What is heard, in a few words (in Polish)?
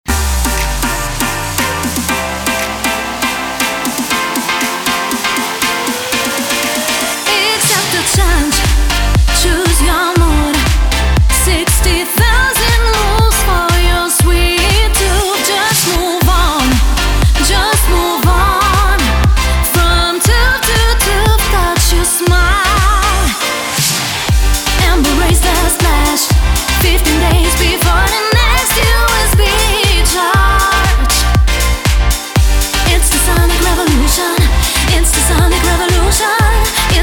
Szczoteczka wokal